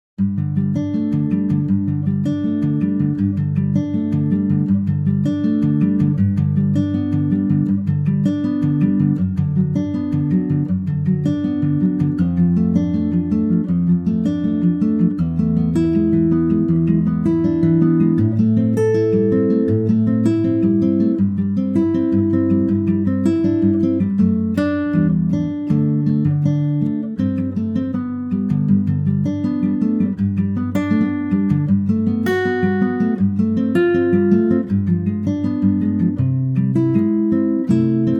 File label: G acoustic guitar; key - G - vocal range - D to A; Superb acoustic guitar only arrangement; slightly faster and more movement